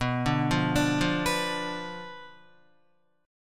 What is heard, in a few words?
B Diminished B down Guitar; Bdim arpeggiated